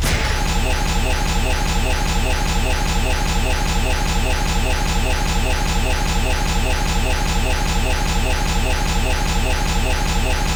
Hand break

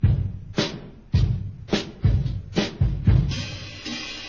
break loop

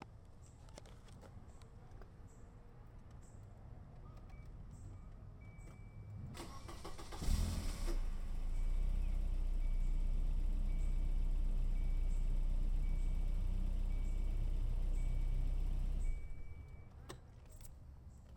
car outside

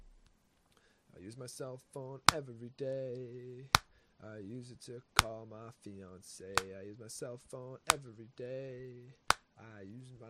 cellphone, bedroom audio

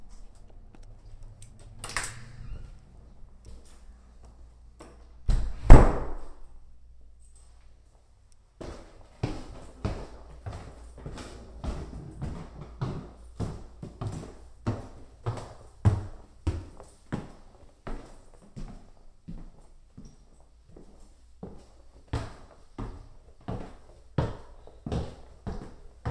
climbing stairs

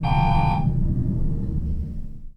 elevator beep

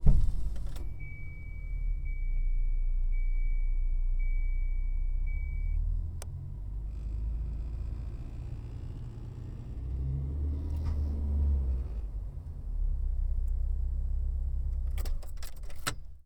car start

